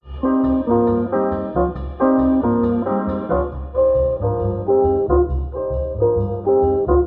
piano and guitar, Phonky loops

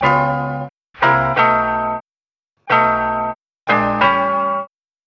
keys, Phonky loops

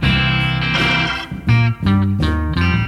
guitar in the hole; Loops; Phonky

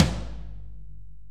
Toms

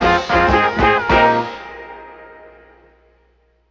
horned toads

